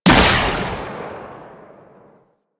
Railgun Fire; Weapons; Warframe; Archwing

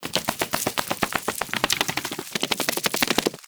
Foley Idle Shake Snow; Enemies; Kubrow; Warframe

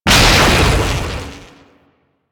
Explosion, Spear Gun; BE Ferrox

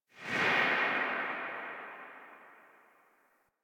Rifle Tail Secondary A; Weapons; Warframe; Tenno; Bard Rifle

